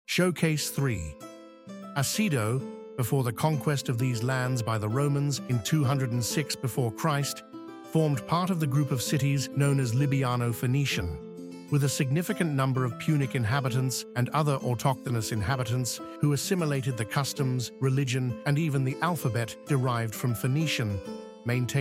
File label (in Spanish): música; archaeological museum audio guide